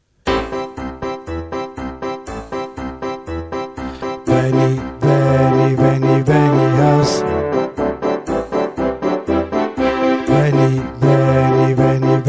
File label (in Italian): contralti coro; Carmina